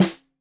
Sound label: Snare 65 Reg Stereo, Deeply Sampled Drums